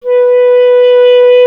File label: clarinet B, Solo Instruments; Miroslav Vitous - Solo Instruments VOL-1; 90 s Sample CDs